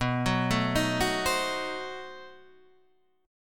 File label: B 7th Flat 9th B Guitar Half Step Down